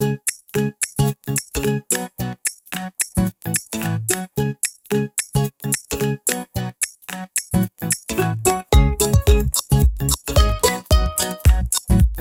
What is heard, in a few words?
dopamine ukulele